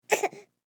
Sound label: Human Baby Cough; Baby Sound Effects; Babies (Sound Effects:Voice)